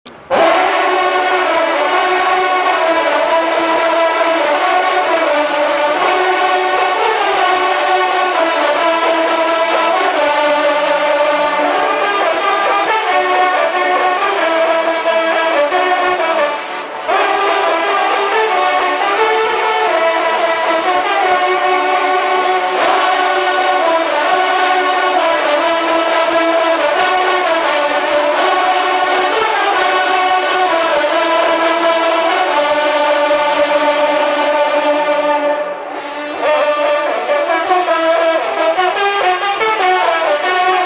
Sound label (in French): trompes